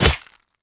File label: shadow kunai hit